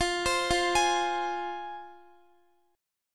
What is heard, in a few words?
F Minor, Guitar; arpeggiated